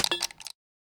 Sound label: Remove Bell; Valley